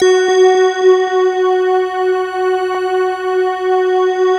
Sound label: BELL C, Slow Bell Choir